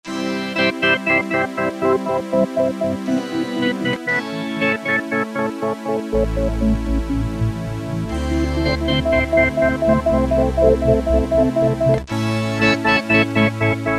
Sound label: pad-SawEkoes; E-mu Orbit V2; Factory presets